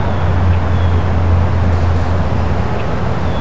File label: city; de tabocas